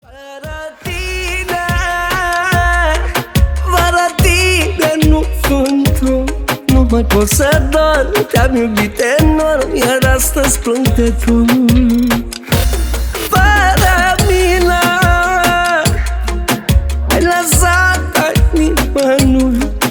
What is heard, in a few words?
sax